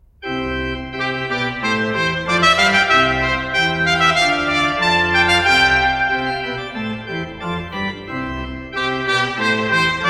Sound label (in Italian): tromba sola; Sonatas For Trumpet And Organ; Sonata Prima; Op4; Allegro; GB Viviani